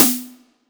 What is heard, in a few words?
Breaker snare; Ableton Foundation FreePacks; Wicked Kits